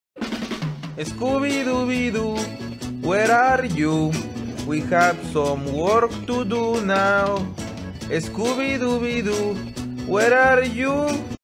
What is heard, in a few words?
escubidubidu; meme sound effect